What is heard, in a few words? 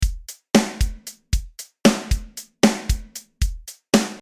Off Snare